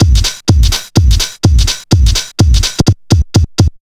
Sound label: walk tall, Deep House 125bpm